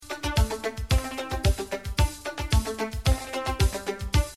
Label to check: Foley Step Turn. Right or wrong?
Wrong